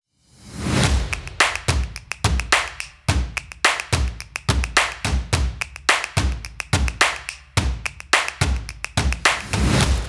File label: percussion and energy stomps; TT teaser